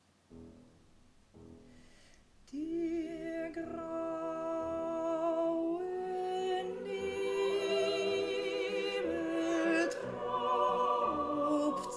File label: bass; Spatherbst Brahms Op 92, no 2